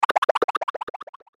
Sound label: Chopper Bright Warble Descend